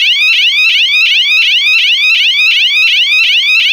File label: alarm